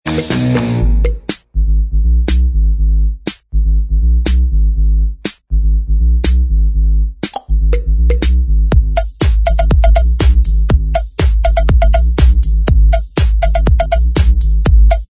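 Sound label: instrumental, backing tracks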